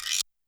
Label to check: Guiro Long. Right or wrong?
Right